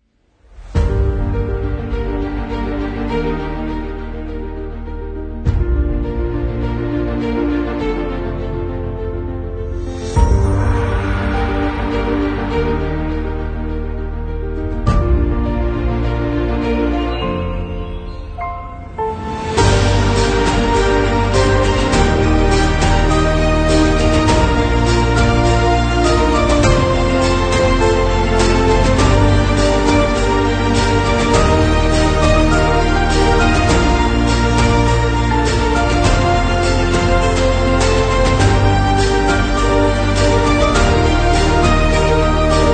Inspiring Cinematic Adventure Trailer

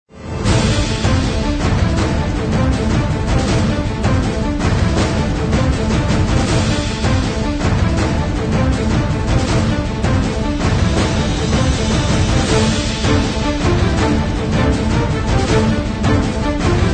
Epic Movie Trailer, 紧张、戏剧性、动作片, 鼓、管弦乐、小号、合唱团; 电影预告片战争包 Movie Trailer War Pack

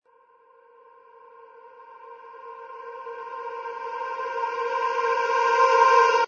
FX Essentials Tonal Reverse C; Cymatics

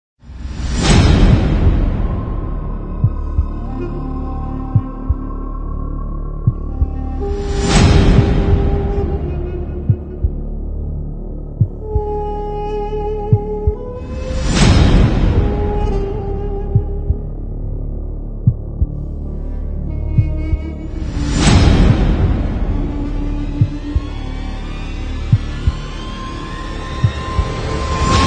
Intense Action Trailer cinematic version

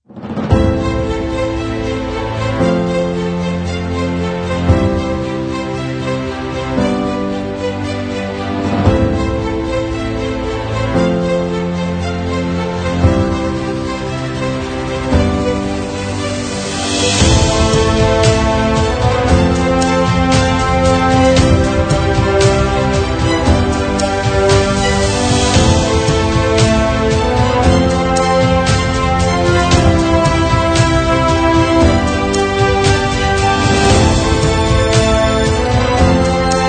Epic Victory Short

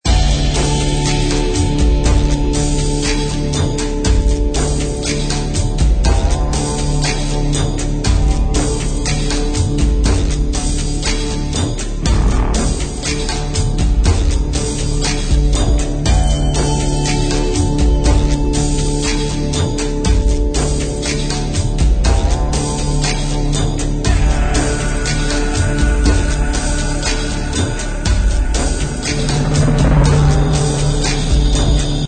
Mystical Orient

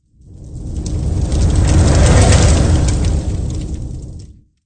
Fire Reveal Logo

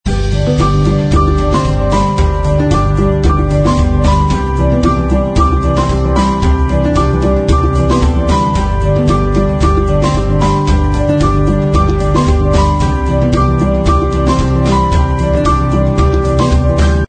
Chorus; 音乐素材 夏日温馨套装 Warm Summer Kit

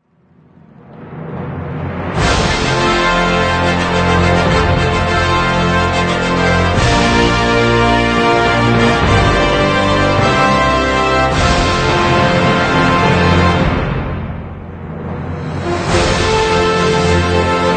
Opening Ceremony

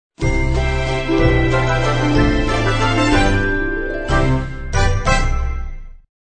Fairy Tale Ident Full mix

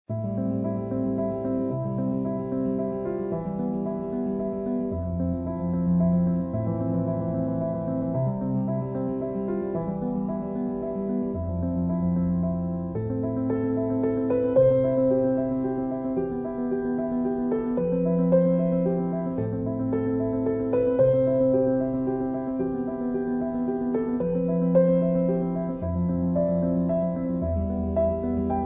Indubitably Mellow loop

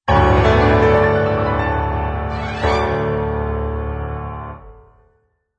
Piano Logo Reveal Long release

